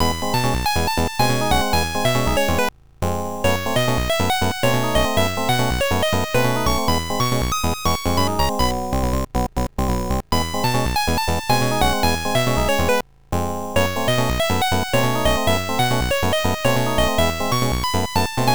Victory BGM; World Circuit PC Engine

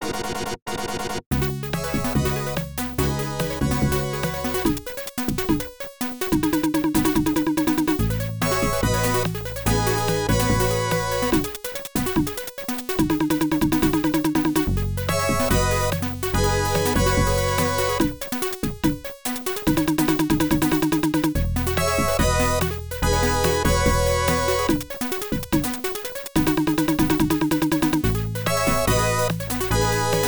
Goal; super sprint; atari system 2; arcade

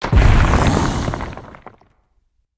pain; Fight Knight

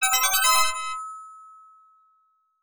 Selection Screen Fanfare; Soldam; arcade

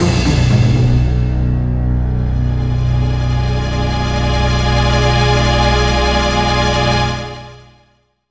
Boss Jingle; from the abyss nintendo ds

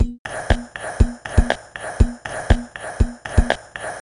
Music Combatbeat

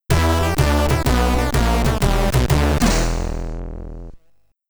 Unused Game Over

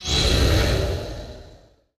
Magic; portal quest android; Necromancer Skill2

